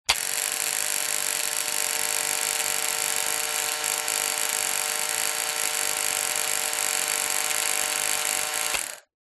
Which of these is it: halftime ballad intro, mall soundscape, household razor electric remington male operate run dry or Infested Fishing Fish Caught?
household razor electric remington male operate run dry